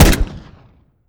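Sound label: decho fire player, weapons; gmod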